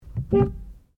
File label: Car Ford Focus Horn Short Interior; Ford Focus hire car; Cars (Sound Effects:Vehicles)